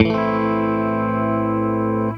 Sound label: A MINOR, Funk Gtr & Wah; PlatinumPhattCD2; E-MU Producer Series Vol. 8 – Platinum Phatt